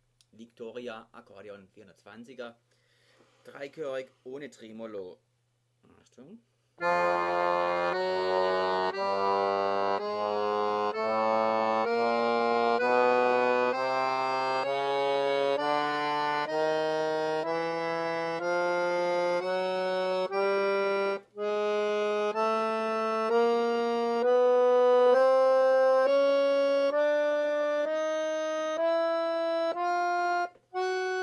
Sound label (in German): VICTORIA A420 toene